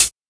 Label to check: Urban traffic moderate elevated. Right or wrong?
Wrong